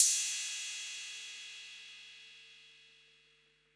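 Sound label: Cymbal Anride; Polyend; Legowelt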